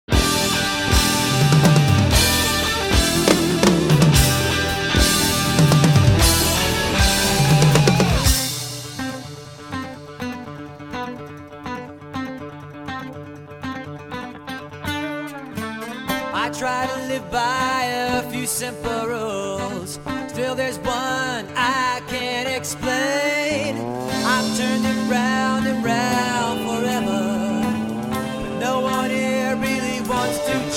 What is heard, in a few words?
Beautiful Rain Drums